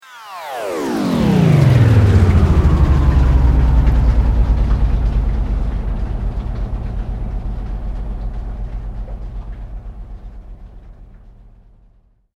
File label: Sweep Mechanical Slow